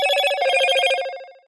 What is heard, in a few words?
Moto Notification Ringtone